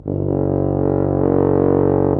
TUBA

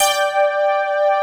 SYN G5; Best Service Gigapack 1

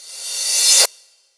Reverse Cymbal

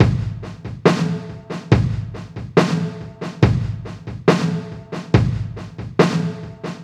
SloMo Beats, Loops 140 BPM

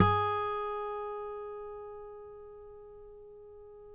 COLLINGS G#4, Guitars; Spectrasonics; Hans Zimmer Guitars VOL-2; FNGSTYLE PFM

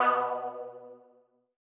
M Lead2 B2; KG MegaSaur

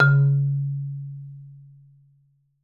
C#2, Marimba

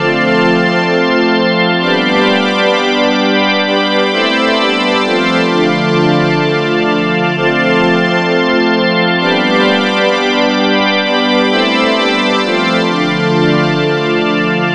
NRG Pad; Sonic Foundry (Sony Creative Software); Trance NRG VOL-1

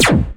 PERC FX

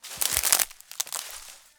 Crunchy Step, outdoor, Footsteps

